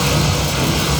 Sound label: skill Ice Breath Loop; MUDevs S20.1-3